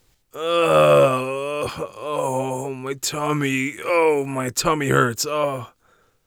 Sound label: tummy hurt, warrior voice; Warrior Voice Pack